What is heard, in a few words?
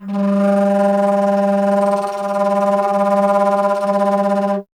Alto Flute, Solo Instruments